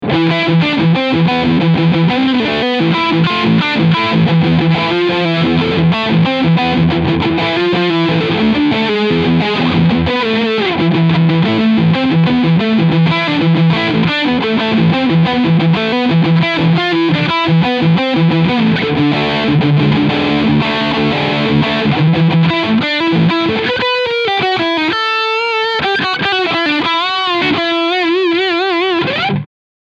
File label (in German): Overdrive Lead Steg Humbucker; Dean Guitars Angel of Deth